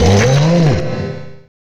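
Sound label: damage; Dagon; Mob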